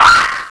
BIRD; BERMUDA

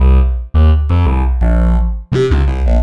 Coit Bass C, Bass Loops; Cyclick Samples; Kit Two

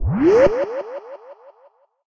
supersight bonus

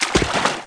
wa step, footstep water

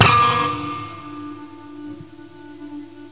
Sound guitar hit

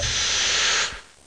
toke

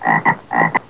frog; animwave

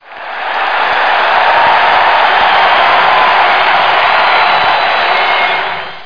CHEER; Macworld (1998-02); Games World; Football'97 Demo; CHEERS

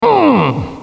Excitement, Vocals, bowl

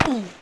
Bat body; PC Gamer IT CD 49; UrbanChaos

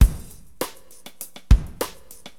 Drums, Acid Jazz Funk; LiveAct Pool

